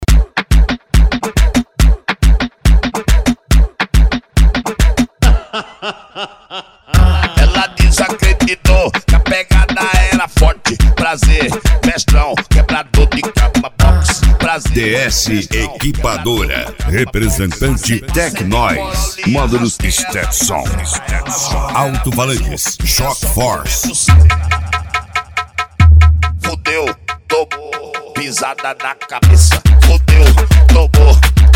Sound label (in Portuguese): funk grave